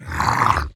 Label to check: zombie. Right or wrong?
Right